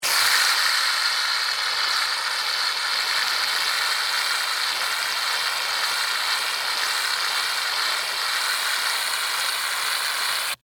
Shaving Cream, Spray; Household; Spraying Sound Effects; Spraying (Sound Effects:Objects); BlastWave

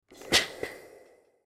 Iron Steam Pump; Household; Laundry

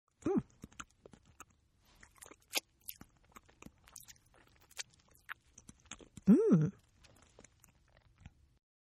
Eating; Sound Effects:Human; Partners In Rhyme

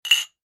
household glasses tumblers x2 clink; Drinking Glasses (X2) Clink Together

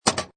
Pinball Sound Bumper Ka Klunk; Video Games; Sound Effects:Games

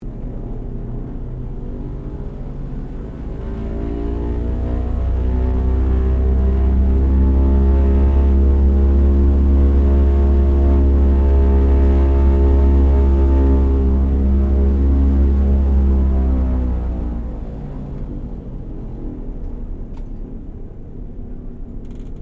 AIRCRAFT DOUGLAS DC3 PREFLIGHT INTERIOR; Qantas 1942 DC3; Airplanes (Sound Effects:Vehicles)

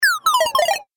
Game App Falling Fail, Video Game Sound Effects

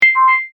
Multimedia Chime, Technology, Multimedia, Chime; Chime Sound Effects; Chimes (Sound Effects:Multimedia); BlastWave

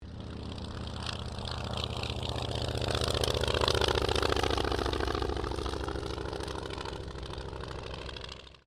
AIRCRAFT RYAN PT22 TAKEOFF; 1942; Airplanes; Vehicles

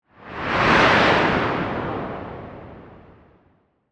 Ghost Pass By, Spiritual, Movement, Whoosh; Horror; Ghost Sound Effects; BlastWave

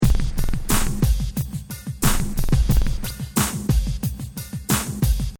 Hip Hop Drum Loop 90 Bpm Straight Without Crash; Drum Loops; Pneumatic; Royalty Free Drum Loops

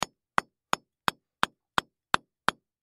Chisel Hits Multiple Wood Hard; Hand Tools; INDUSTRY; Blastwave

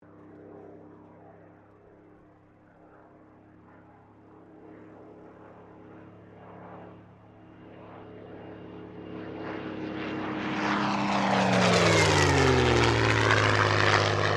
AIRCRAFT SUPERMARINE SPITFIRE MK-XVI PASS

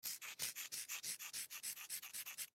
Chalk Sidewalk Scribble On Cement; Foley; Writing; Human; Blastwave

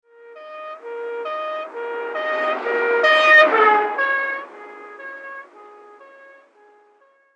Euro Police Siren Driveby; Emergency Vehicle Sound Effects

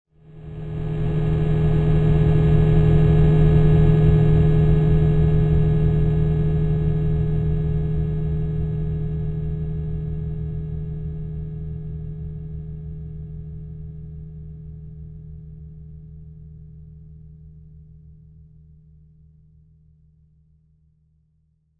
Looming Industrial Fear, scary soundscape

